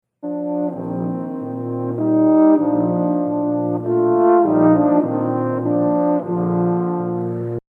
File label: Waltz; Brahms